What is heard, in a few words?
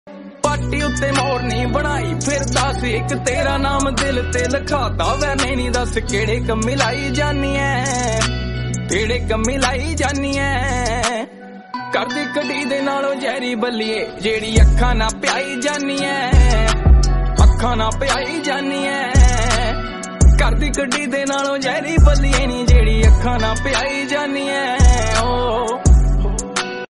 lofi Punjabi bgm